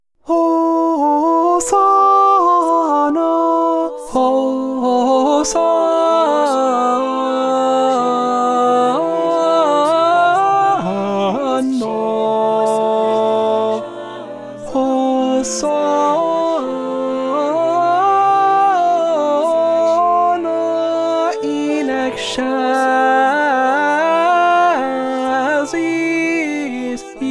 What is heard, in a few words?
1st Tenor; PLENI SUNT Cappella Sistina MS 17 “Missa Mille Regretz” (Morales)